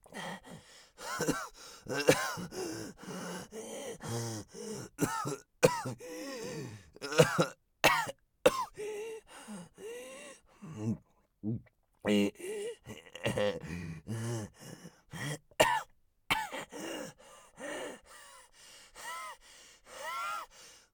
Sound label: breath death; Escape from Tarkov; scav2